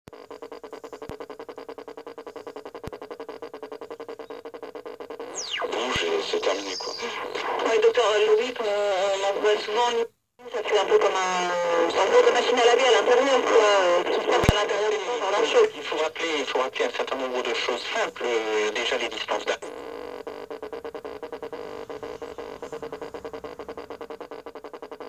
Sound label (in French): batterie 6 lampes